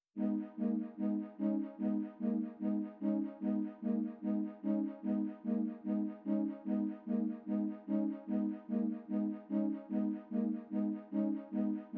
148 BPM G# Min String Low; Cymatics; Destiny Gold Expansion Stems; Seven Deadly Sins

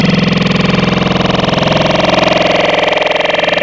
Gag Reflex; Wavetables; Cymatics; Vomit for Serum Vol 2

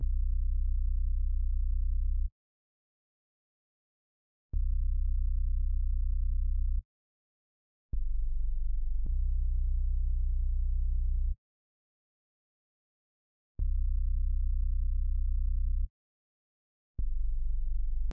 106 BPM B Min Bass; Cymatics; Destiny Gold Expansion; Exotic

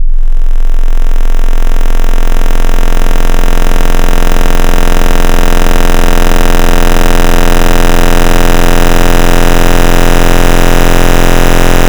Sine Crunch, FM Wavetables; Cymatics; FM Wavetables Vol 2; Serum

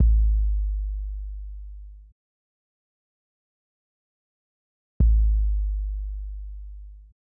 Kick B

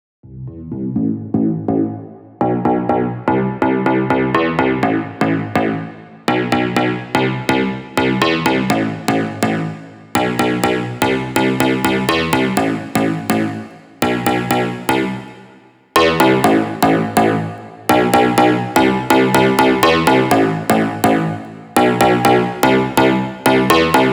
Bass WET, 124 BPM A; Big EDM; Future House Tools; Construction Kits; Rocking

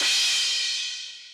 Crash, Hip Hop

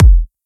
EDM Future Kick

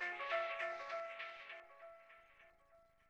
Stab; Thirty-One Flavors

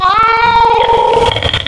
Cat, Wavetables; Cymatics; Serum; Animals for Serum - Gold Edition

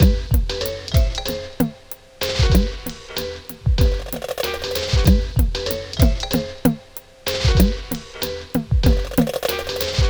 Beat 095, Hip Hop

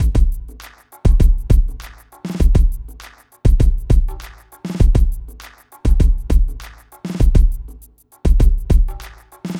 Drums; Hip Hop Philosophy; big fish audio; Apple Loops; 100 Emin

